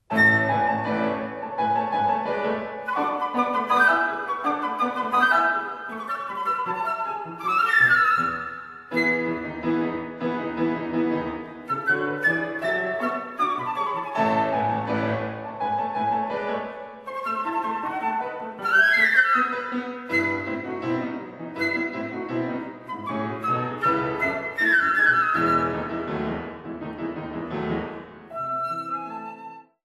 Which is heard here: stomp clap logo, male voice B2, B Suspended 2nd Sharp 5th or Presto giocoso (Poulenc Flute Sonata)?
Presto giocoso (Poulenc Flute Sonata)